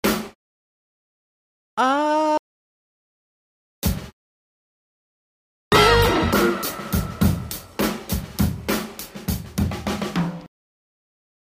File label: nighttime clean sounds